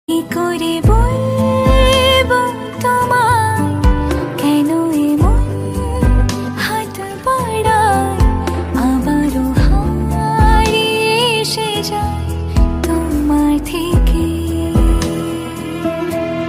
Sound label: Bengali Ringtone